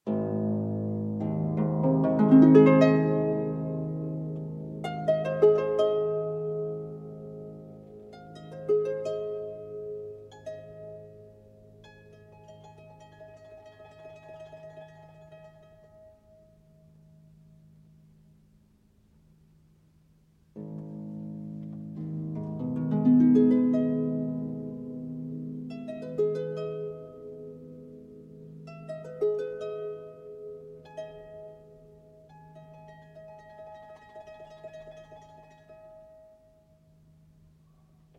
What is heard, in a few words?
Impromptu-Caprice For Harp